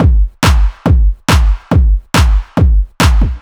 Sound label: Tekk Base D